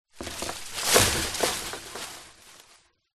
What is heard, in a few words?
impacts tree small fall onto wire fence bounce